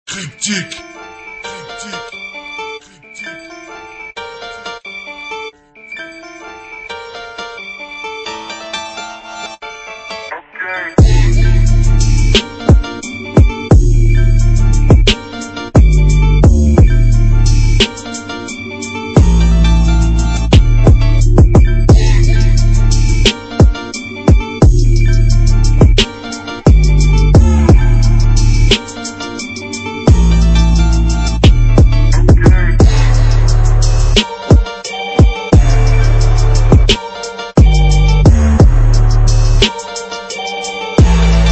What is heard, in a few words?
Trap; Kryptic Samples Trick Or Trap 2